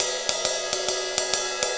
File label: Ride Cymbal Pattern